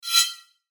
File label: Mouseover Fast Airy Whoosh Scrape; Multimedia; Blastwave